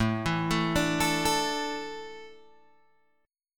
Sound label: A Suspended 4th, Guitar Open G